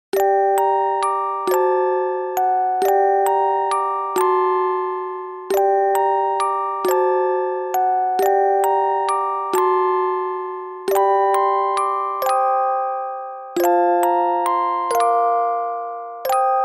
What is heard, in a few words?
Horror Music Box